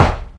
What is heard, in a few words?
shoot; HeavenStudio; spaceball